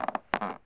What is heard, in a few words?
bench, church; AMBIENT; Pirates Of The Caribbean